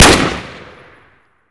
p90, weapons; Modern Warfare

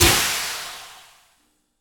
Aircrafts Cockpits Depressurization In; DCS

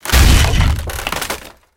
tree break

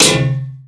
tank hit; War Thunder; sfx tanks

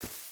footstep boots grass run; Rust

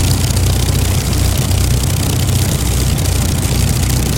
spitfire side, engines; War Thunder

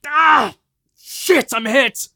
hurt medium; Escape from Tarkov

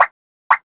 car alarm off; Call of Duty Modern Warfare

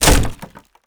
connect wood

